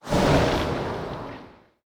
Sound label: gun